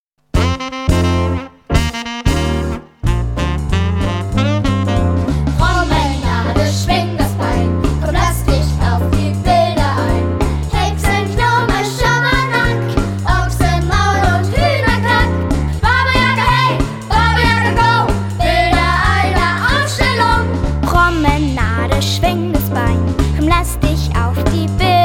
Rock, Schlagzeug; Bilder einer Ausstellungs

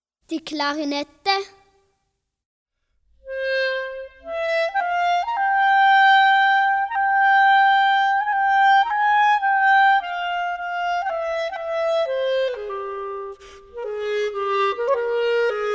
Klarinette; Karneval der Tiere

